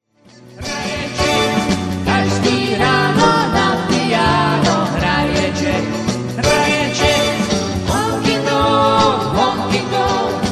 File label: honky tonky blues